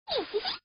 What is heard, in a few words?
hee hee; iori nii